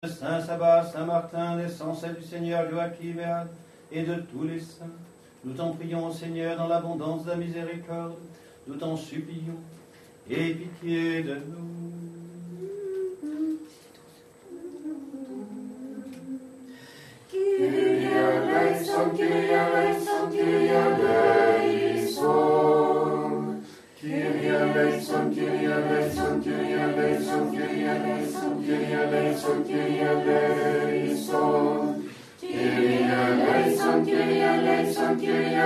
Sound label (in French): Kyrie eleison, Matine de la vigile orthodoxe; avant canons